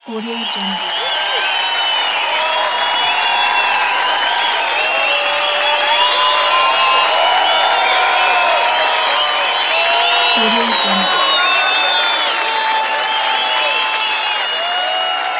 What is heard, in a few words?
whistling applause